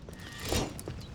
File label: light attack air; for honor